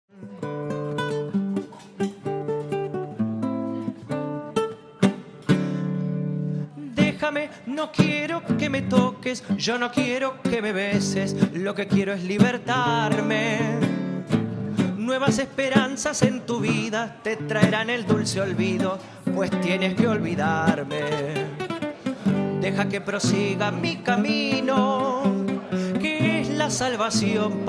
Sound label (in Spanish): show de tango para eventos, cantor guitarras; bailarines; Innsbruck; Solomusiker